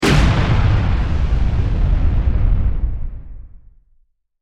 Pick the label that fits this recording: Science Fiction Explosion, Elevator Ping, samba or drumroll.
Science Fiction Explosion